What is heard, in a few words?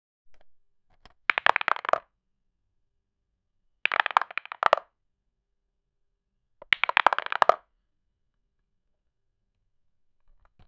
poker fold sound, card games